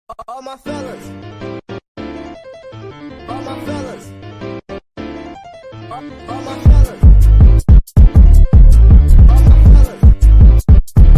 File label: bass boosted